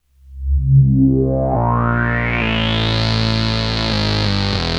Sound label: Arp C Line, ARP Odyssey; musicradar-synth-samples